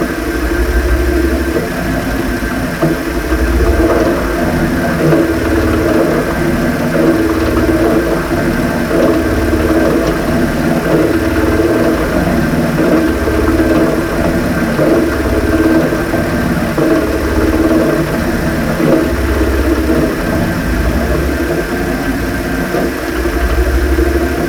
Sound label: Veil Machine Amb Loop; Venus; Warframe; Veilbreaker; Kahl Quest